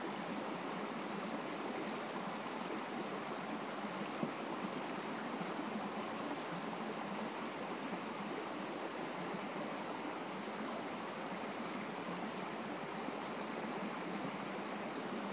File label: camping next to a clear mountain creek in nc